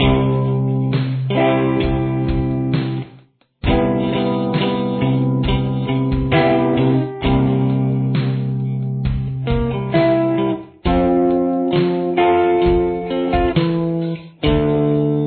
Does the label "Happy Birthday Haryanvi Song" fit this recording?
No